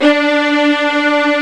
arco viola; E-MU Formula 4000 Series Vol. 5; Protozoa; Proteus2 Presets

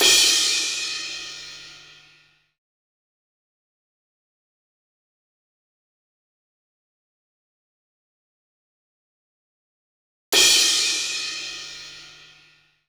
Doobie Crash, Cymbal; Zero-G Smoke

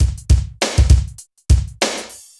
Ambience, BPM100; Ambience Loop; AKAI S6000 CD-ROM - Volume 4

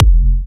Jump Kicks, Bassdrums

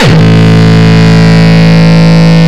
Longkick, kicks

